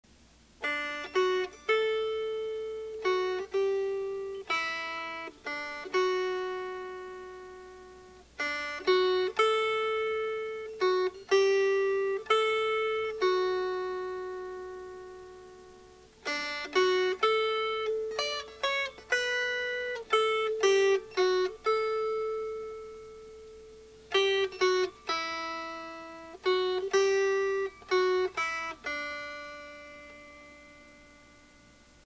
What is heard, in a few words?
Melody; Little Rosewood Casket